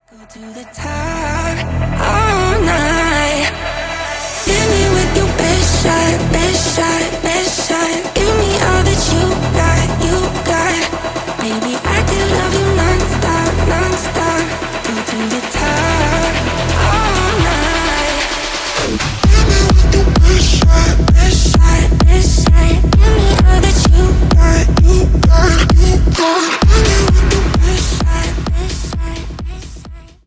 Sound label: Blues